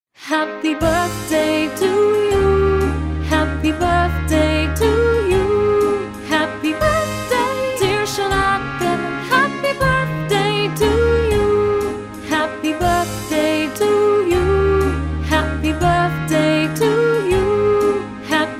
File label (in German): happy birthday charlotte